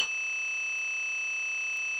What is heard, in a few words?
Instrument